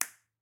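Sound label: Finger Snip Single; Latin Percussion; Drums; Drum Hits; Ableton; Factory Packs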